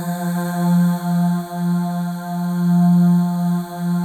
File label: AH, FEM; Spectrasonics; FEM.2 AHS